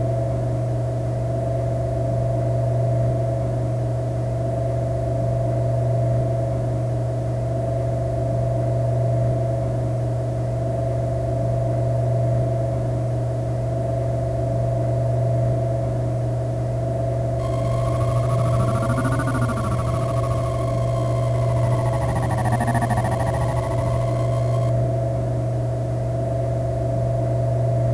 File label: engine